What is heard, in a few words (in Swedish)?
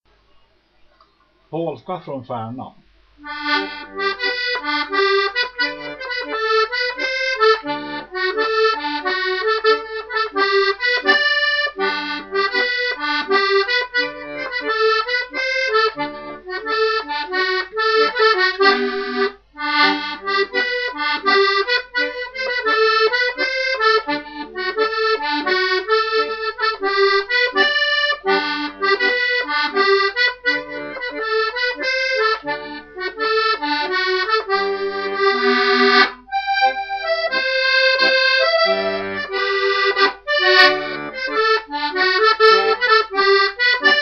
Polska från Färna